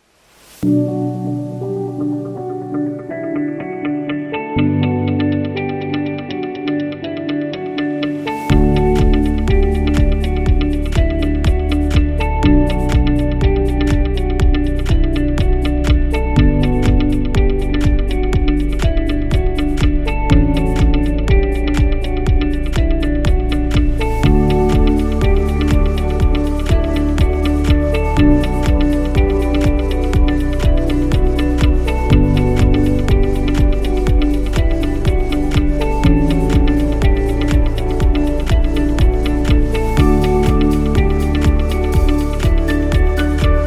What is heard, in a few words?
Business Ambient; corporate music vol 21